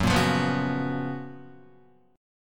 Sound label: Gb Major 11th Guitar; GbM11 strummed; JGuitar